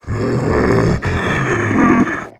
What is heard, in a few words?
alert, male zombie; nmrih; zerosiki; slender